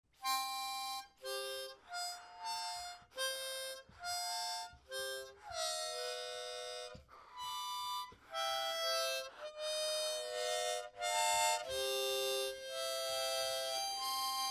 Harmonicaa

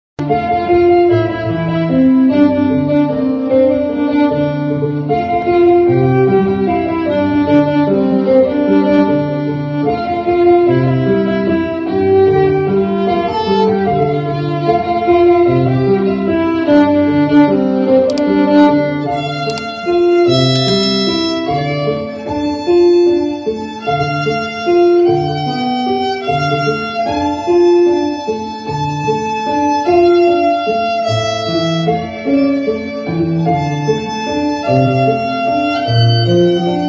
Violin; ویولن نوازی میم مثل مادر

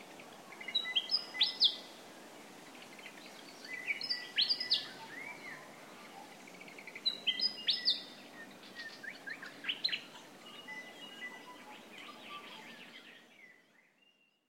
Willie wagtail, پرنده دم چتری ویلی

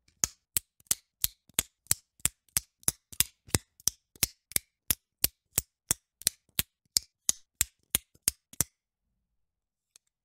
flick knife; چاقو ضامن دار

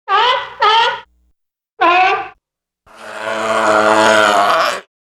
Seal Barks

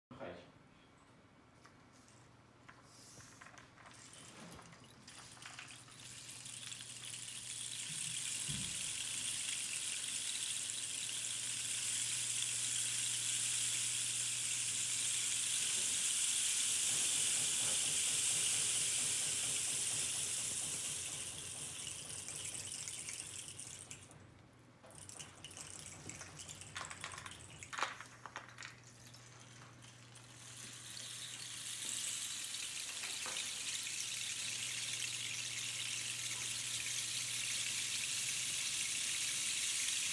Rainsticks; ساز باران

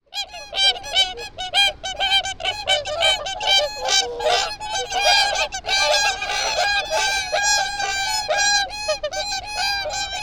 Whooper swan

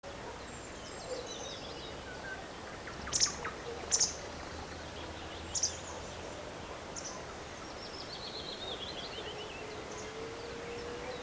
White Wagtail Motacilla alba

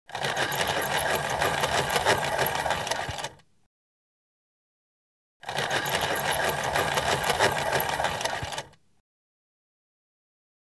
Pencil Sharpener; تراش رومیزی

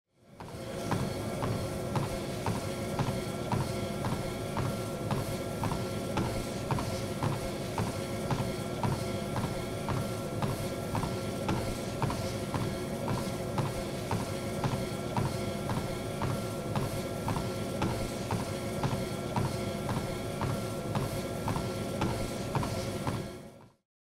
treadmill

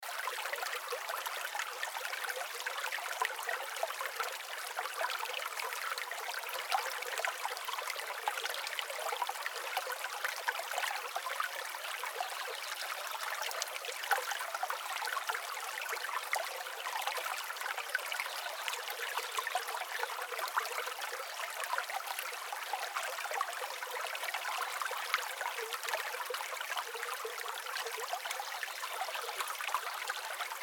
forest stream birds sound loop

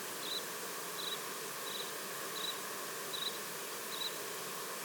Curlew sandpiper; پرنده تلیله بلوطی

